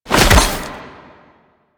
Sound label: Glaive Catch; Enemies; Weapons; Warframe; Teshin; DE